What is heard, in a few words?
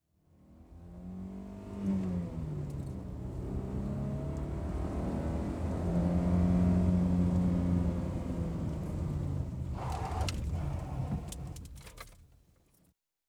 Police Car Arrive With Slight Ski; Emergency; BBC Sound Effects Library